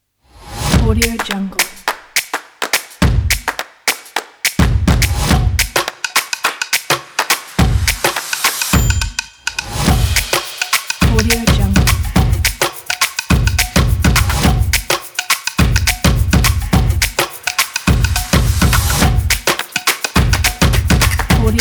stomp kit

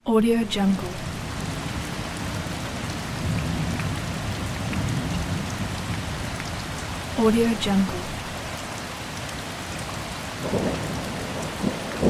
rain

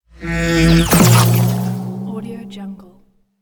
cartoon crazy sound effect; volkovsound

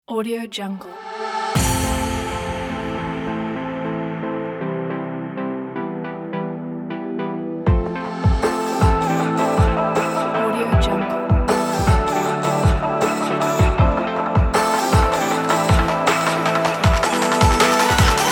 energetic future bass technology

